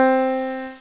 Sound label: piano soft